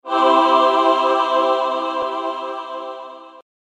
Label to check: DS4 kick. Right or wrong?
Wrong